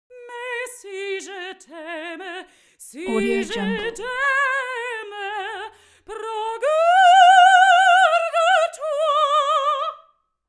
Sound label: human vocal female sing opera; smartsoundfx